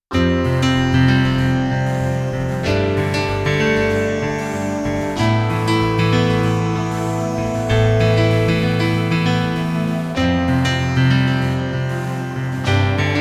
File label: yamaha montage 8 performance; os 2.0